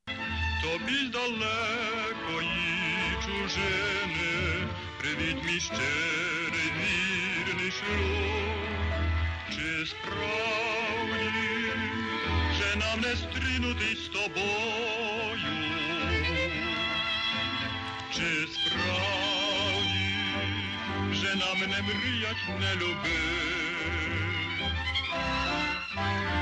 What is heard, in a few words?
Ukrainian tango